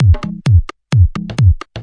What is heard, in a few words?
130 bpm micro house; drum loops and breaks; elab